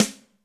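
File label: Snare Wrap, Alesis D4 drum machine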